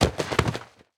Impact Body Fall In Dirt Fast; Impacts

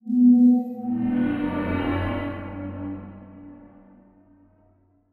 Title Transition Swell; Production Element; AdobeCC

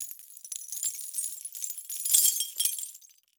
Crash Glass Debris Falling On Concrete; Crashes

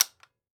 Weapon Gun Rifle M16 Dry Fire; AdobeCC

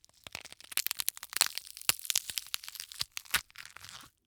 Horror Scary Bones Break And Tear Slow

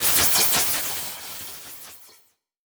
Transition Electrical Surge; Production Element Title Transition

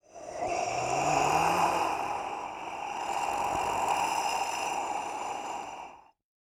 Horror Scary Alien Breathing

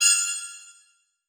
Transition Bell Hit; Adobe; Production Element

